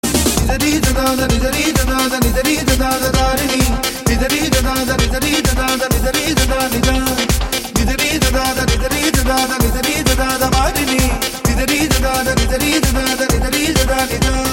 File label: Instrumental Ringtone